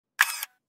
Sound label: Camera Shutter